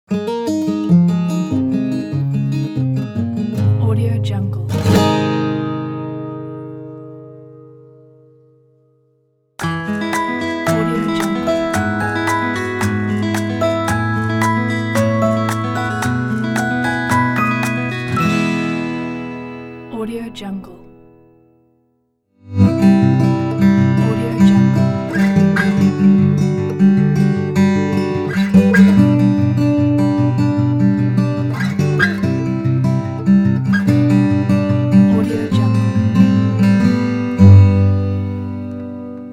the acoustic guitar logo pack; blackcrocodile

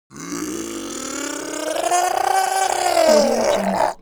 creature zombie human change; smartsoundfx

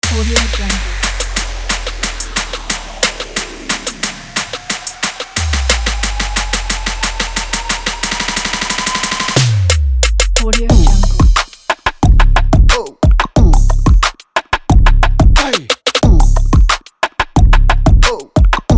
rap in

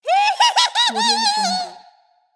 stupid laugh

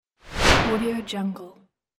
whoosh sound

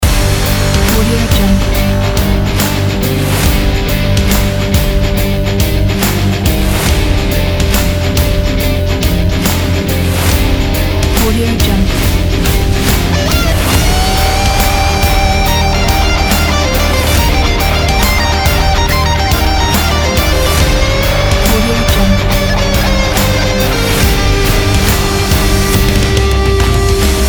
action rock loop

